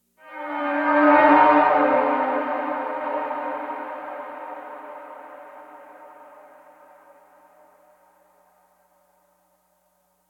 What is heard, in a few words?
Roof 16 Bars 155; E B Robert Gives Fake Pulse